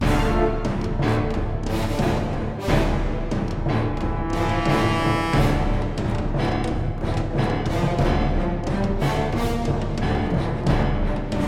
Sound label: Motivational; Crysis